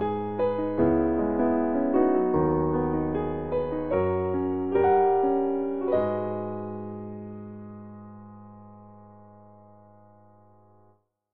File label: start